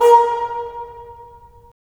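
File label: WND BN, wind bassoon; LA Composer vol. 3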